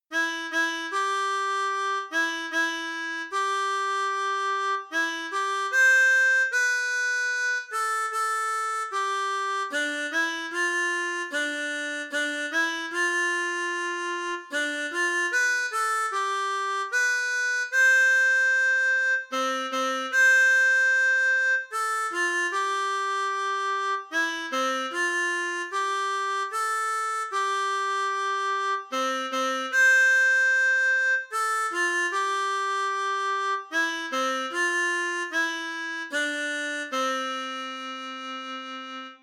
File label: Wiegenlied, harmonica; Brahms